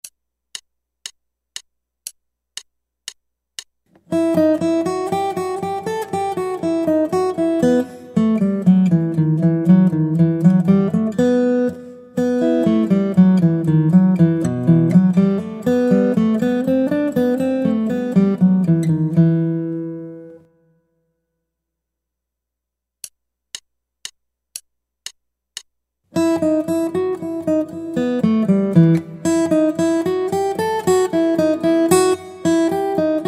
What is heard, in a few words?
Guitar